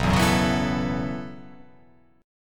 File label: B 7th Suspended 2nd Suspended 4th down, chord